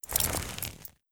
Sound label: Armor Pick Up